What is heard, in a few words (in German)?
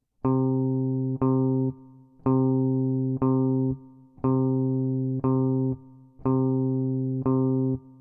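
Rhythmus Viertel und 1 Achtel und Pause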